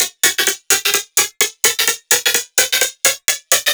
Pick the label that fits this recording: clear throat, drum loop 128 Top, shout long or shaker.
drum loop 128 Top